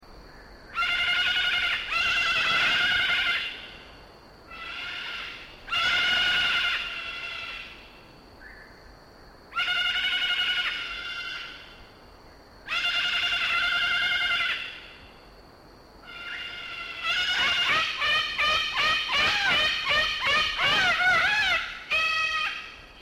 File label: Cacatua moluccensis